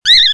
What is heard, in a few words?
CRY, Crying Baby